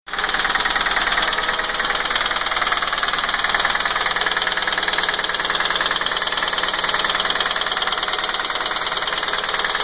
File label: engine noise i35 2003 engine noise; infiniti i35